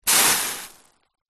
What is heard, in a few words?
horror acid burn; zapsplat